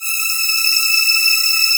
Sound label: AIR VOX E5; AKAI S-Series CD-ROM Sound Library VOL-7